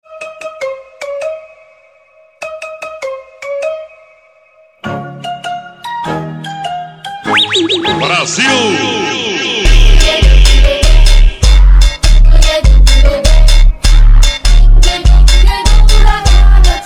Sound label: Funk Slow